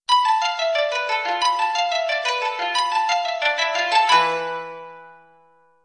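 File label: soft jingle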